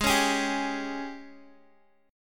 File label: Ab Major 7th Suspended 4th Ab Guitar DADGAD; down